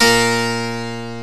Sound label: HARPSICORD